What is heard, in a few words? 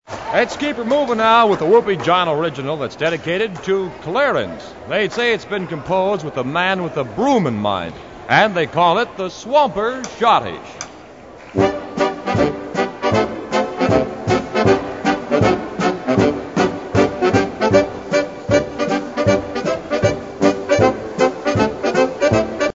schottische